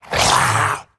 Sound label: Zombie Monster Attack; Undead